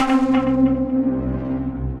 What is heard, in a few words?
Plucks; Geosmin Plucks; Ableton; Mood Reel; Placid Plucks